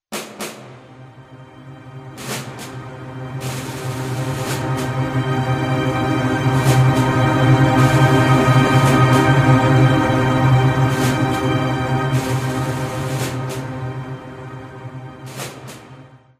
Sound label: stinger; World War II Combat: Road to Berlin; Xbox